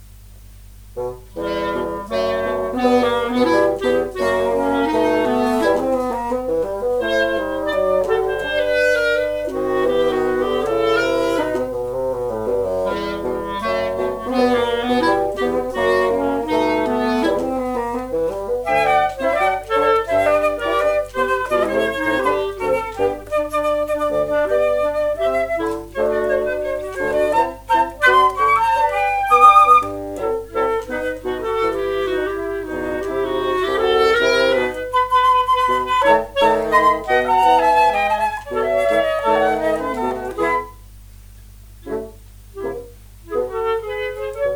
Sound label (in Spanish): Danza Cubana; Cervantes - 5 Danzas Cubanas (Fl, Cl, 2Bsn)